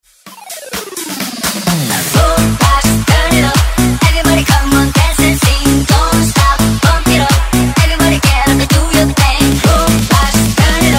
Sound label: Dance Redrum